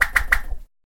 swish; attack

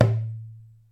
small drum

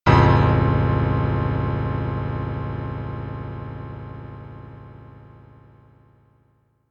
horror piano

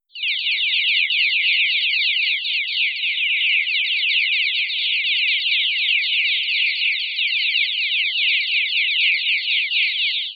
chicks; animals

